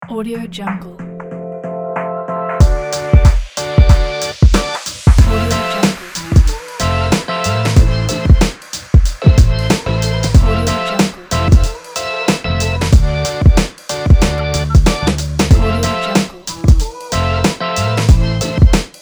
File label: chill hip hop logo